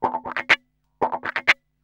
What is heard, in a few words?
GUI, LICKS; 120 LICKS